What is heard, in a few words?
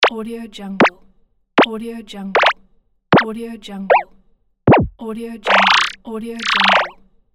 ui bloop buttons